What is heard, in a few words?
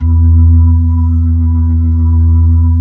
B-3, Blues